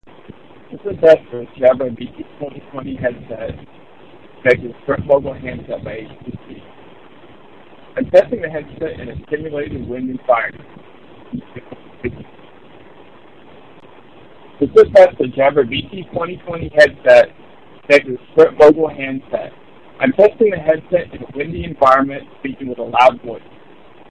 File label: Wind; JabraBT2020